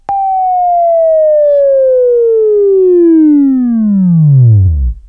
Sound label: Buzz, Left; Sweeping Training